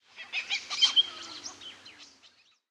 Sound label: bird; temple of nudges